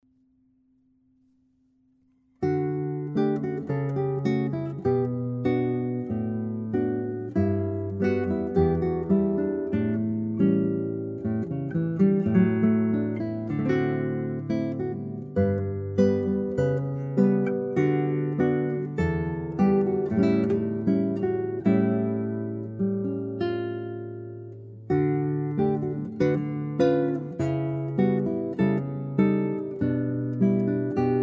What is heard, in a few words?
choro with reverb